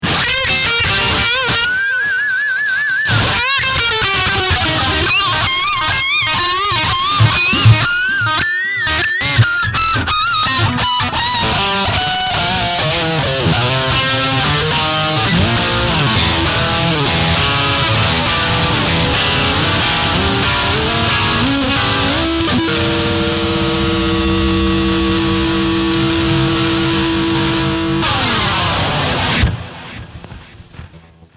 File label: Harmonics Lead